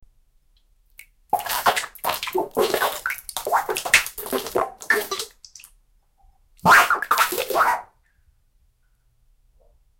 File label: male chimpanzee suffering; primate